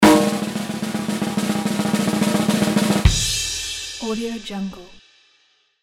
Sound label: snare drum roll